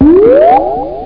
warp

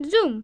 zoom; plzoom

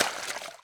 Water Hit; 1944 Battle Of The Bulge

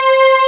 strings; ankistrings3

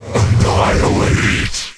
Empty Recycle Bin; BroodWar

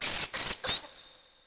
RAT; urbanchaos

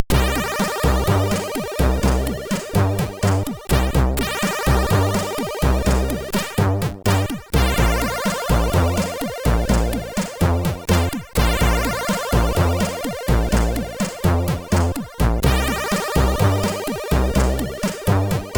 Bossa Nova, SIDTunes

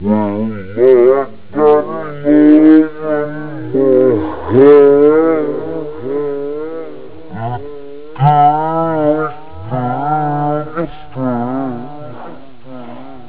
sing, speech; OctaMED SoundStudio V1

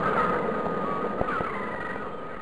seagulls; U-Boat 1.5.3

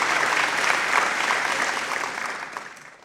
Applause; Educatif; Comparaison

